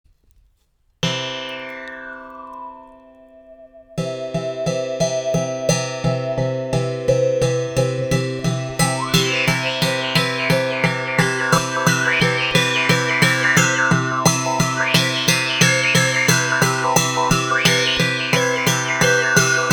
2 cordes classic, guimbardes; comicbow

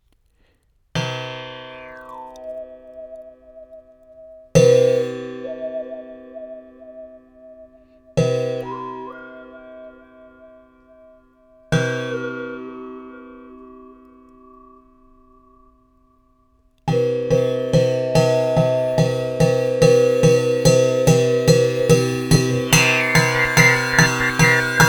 2 cordes classic, guimbardes; comicbow

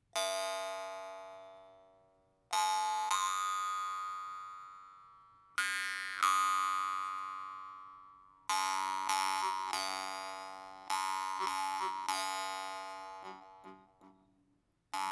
cricket, guimbardes; glazyrina

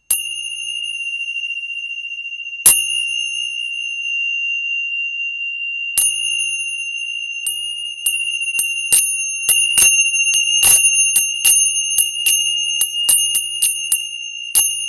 Crotales, Paire de cymbales pouvant être jouées entre 2 doigts ou une dans chaque main, le son est puissant et clair.

